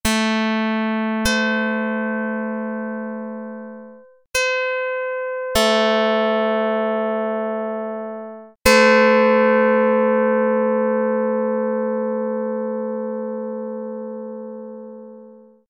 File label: pluck; dr220